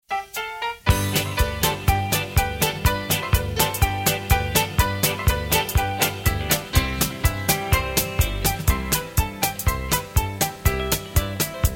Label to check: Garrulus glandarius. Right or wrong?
Wrong